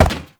Step Metal; Warframe; Uriel

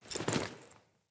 Female Foley Action Default Dodge; Player; Female Default Clothing

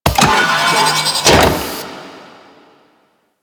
Reactor Vent Shield Open; Warframe; Missions

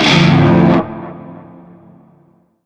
Shawzin Maj Chord B; Music; Warframe; Narmer

